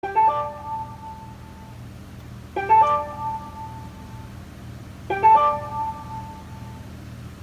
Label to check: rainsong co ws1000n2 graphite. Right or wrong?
Wrong